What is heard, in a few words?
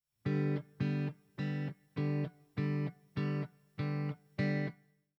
Plucked Fingers, guitar